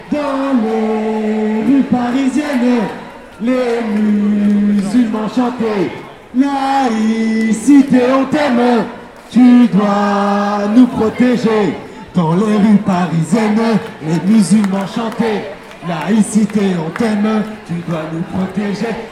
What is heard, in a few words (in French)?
chant laicité on t'aime tu dois nous protéger